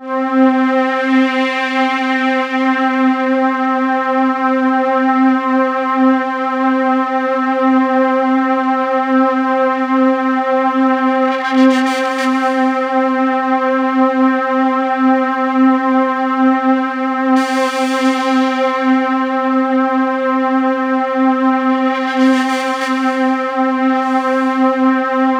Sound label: C3, SynthLine